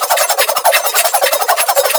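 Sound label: Shotgun Projectile; Warframe; Elite Shotgun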